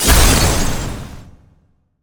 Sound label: Cardbreak